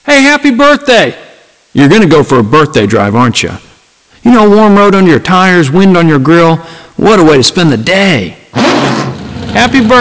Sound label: happy birthday sing a tune; balon pojoci